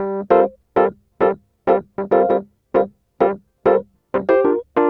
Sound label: Smooth Groove Rhodes, 70s Analog Funk; Sonic Foundry (Sony Creative Software); What it Is!